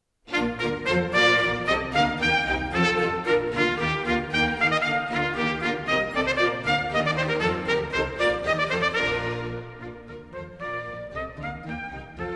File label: Concerto for Trumpet; Georg Friedrich Haendel